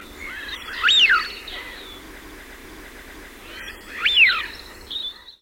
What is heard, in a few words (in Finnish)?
haapana; Tero Linjama